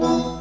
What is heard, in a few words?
menu command; phantom